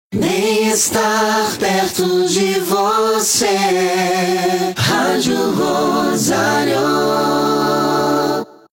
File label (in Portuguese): VH ACAPELLA BEM ESTAR; shopping; ROSARIO